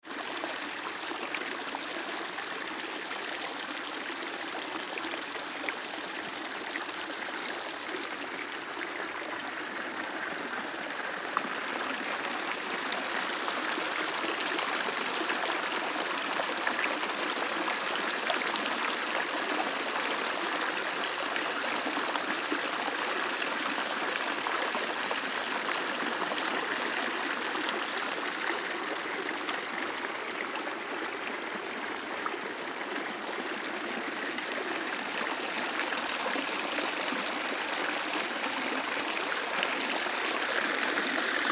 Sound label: water; 超強力能力開発ページ音